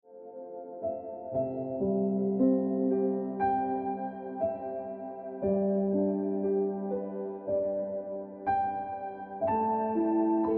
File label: Ringtone Piano Music